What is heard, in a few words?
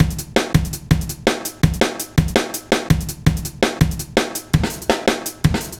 Heavy Live, Jungle, Drum Loops 165.5; Zero-G Jungle Warfare 1, 2 & 3